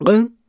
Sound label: Mouth Fx, Vocal